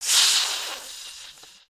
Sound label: baby spider idle; creatures mechanical